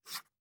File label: blueprint reveal success; Rust